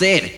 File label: Vocal Oneshots; Vengeance Samples; Vocals